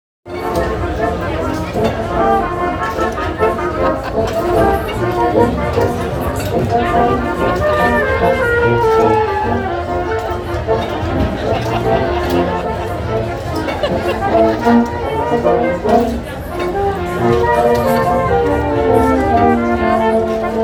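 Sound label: live band merry go round, oktoberfest; octoberfest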